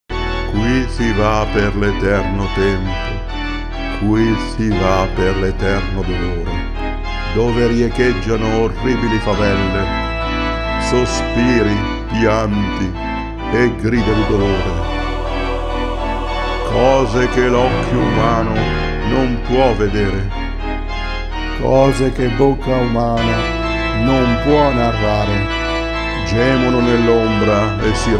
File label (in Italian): voice